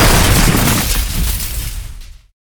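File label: stone phantom knight death; monster; thedead3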